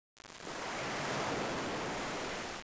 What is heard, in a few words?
sea wave; theodyssey